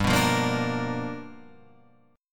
G 7th Sharp 9th G chord, Guitar Open F; G7#9 strummed; JGuitar

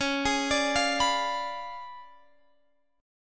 Db 7th Flat 9th; x,16,18,19,18,19; Db7b9 arpeggiated

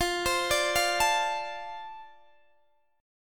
F 6th F down Guitar DADGAD; F6 arpeggiated

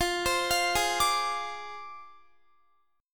F Minor 6th F down Guitar; arpeggiated; tuning 4E,4A,5D,5G,5C,6F

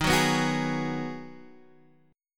Ab Minor 7th

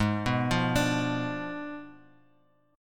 Ab Diminished 7th Ab, Bass Standard; Abdim7 arpeggiated; JGuitar

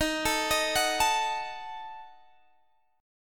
Eb Diminished, Guitar Open D; x,18,19,21,21,19; down

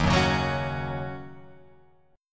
D sharp Minor 7th, Guitar, Open F; strummed; JGuitar

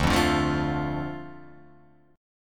D Minor D Guitar Open F; Dm strummed; 2,0,2,0,0,0; JGuitar

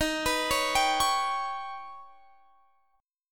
Eb Augmented 7th Eb down Guitar Drop D

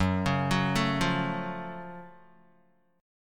Gb Minor Major 7th Gb, down, Guitar; arpeggiated; 4E,4A,5D,5G,5C,6F; JGuitar

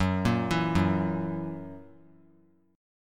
F sharp 7th, down; arpeggiated; JGuitar

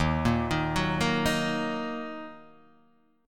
Eb Minor, Guitar, Half Step Down

